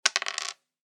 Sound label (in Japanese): サイコロを振る; ボードゲームやカジノ、麻雀の効果音です。